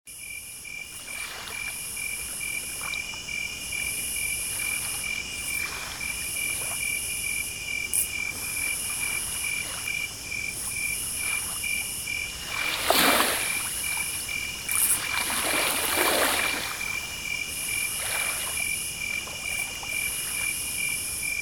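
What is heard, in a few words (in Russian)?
zvuk nochnoj reki, night river sound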